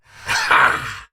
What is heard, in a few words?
Attack Effort; Warframe; Dax; Dax Knight